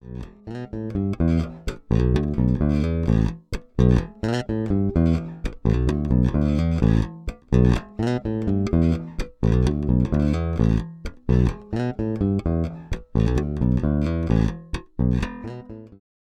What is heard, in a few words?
Fender Precision Bass Bridge Pickup passiv; Troy Sanders